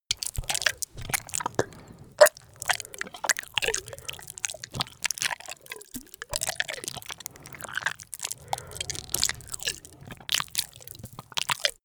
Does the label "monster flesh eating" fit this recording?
Yes